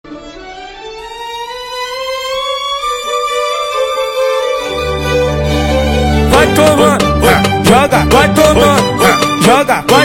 Phonk funk

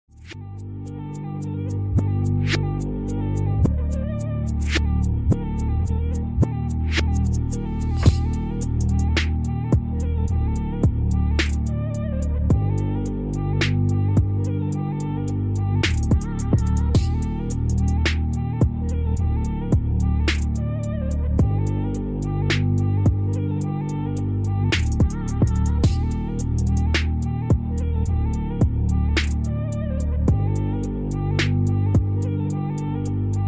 Remix Instrumentals

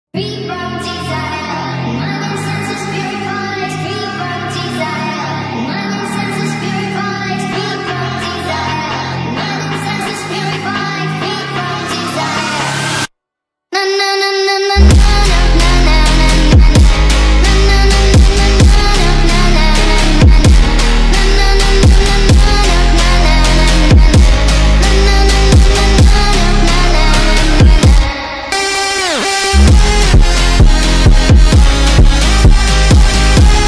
Phonk FUNK

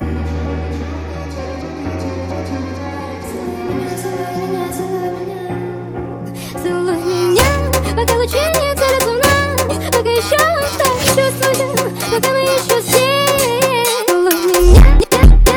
Phonk

